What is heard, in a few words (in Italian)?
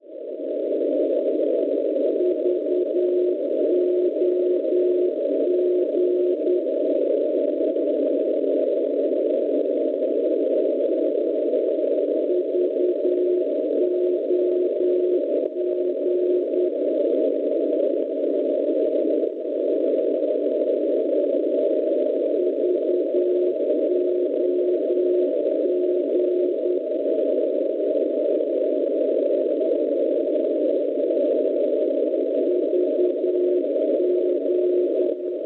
NDB, Voghera Italia 335.5 kHz; Schwarzsee